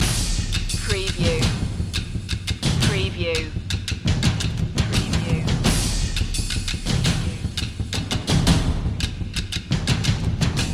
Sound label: percussion loop